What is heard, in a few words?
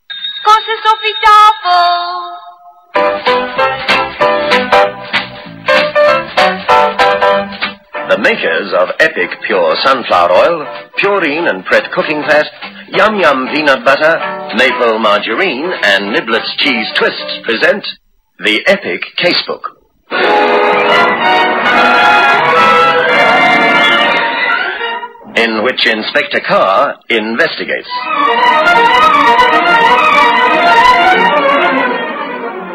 jingle